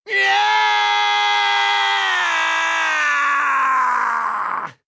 death; joker

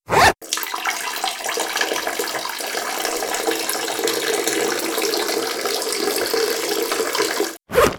peeing

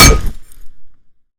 pan hit, frying pan, melee; weapons

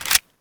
clip in; trh 92fs

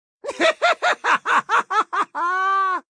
hurt med; joker

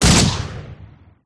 gauss shot, railgun

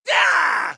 hurt heavy; joker

